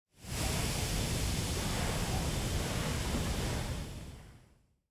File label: lava; insects deep mud; factorio